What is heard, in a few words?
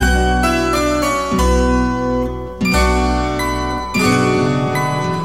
keys, jazz loops